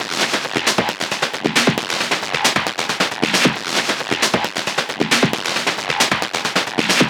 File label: Perc Loop 135, Techno